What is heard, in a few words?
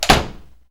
Door Close